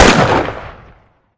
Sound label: sniper rifle classic shoot; tf